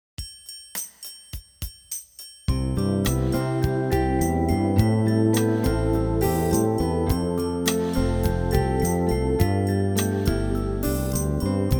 Improvisation With Sixths Slow

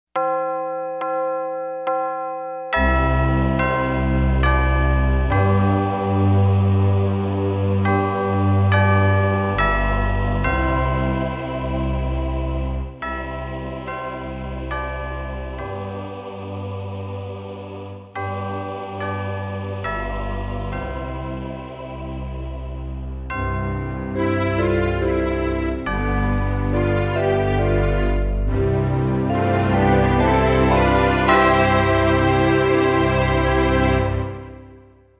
Westminster Chimes

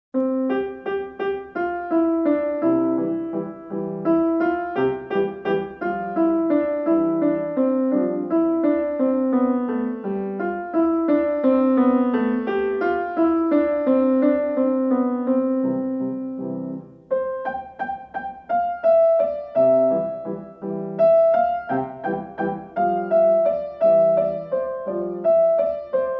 piano; Toy Town

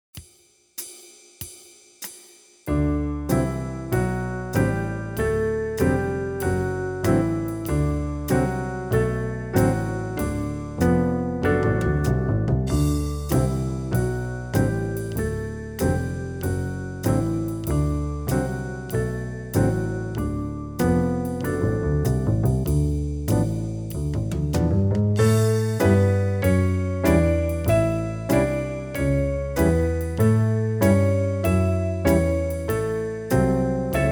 D And A Major Pentascales Slow, piano

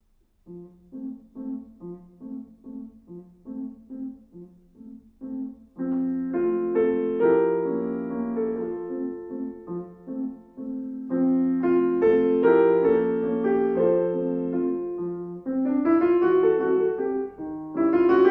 Waltz of the Flowers piano

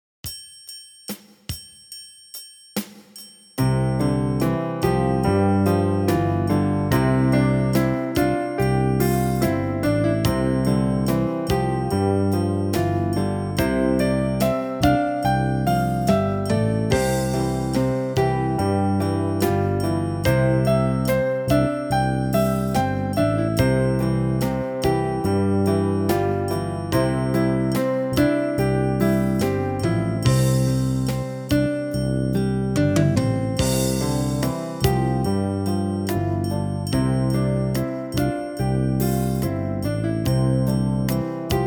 Imitative Study Slow

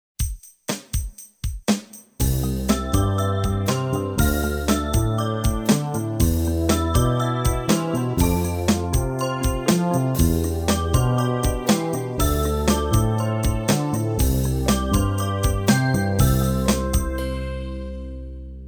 Pedal Exercise

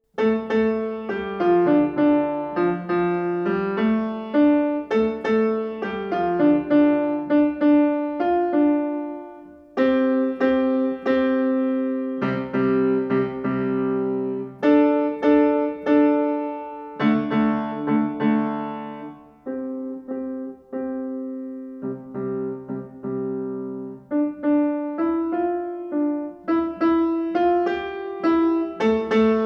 Canoe Song piano

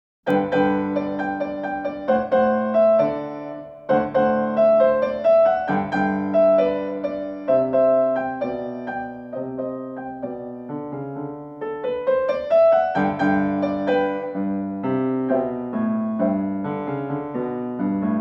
Tijuana Tambourine piano

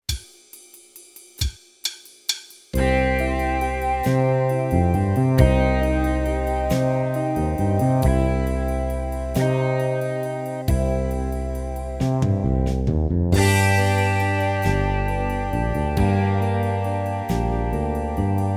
Major And Minor Triads

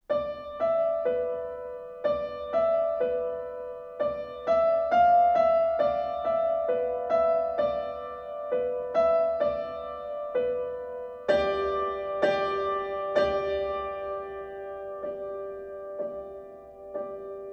piano

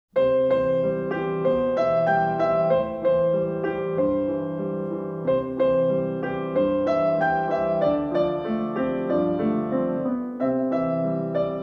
piano

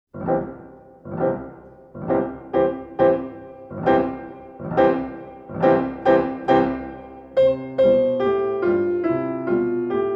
piano

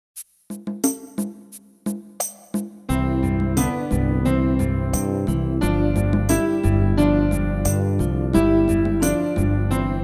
Warm Up With 2nds Slow

